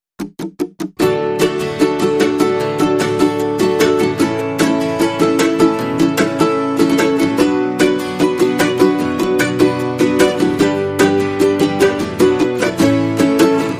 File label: Ukulele and Guitar Mix